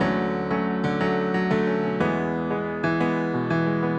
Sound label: Pop Piano